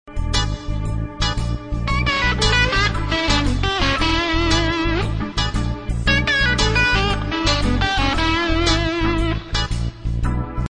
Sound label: blues